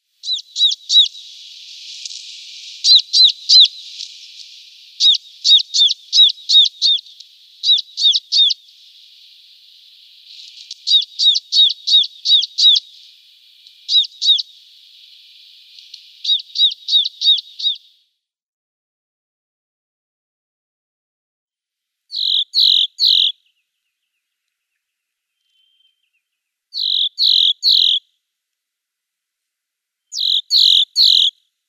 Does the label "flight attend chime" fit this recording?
No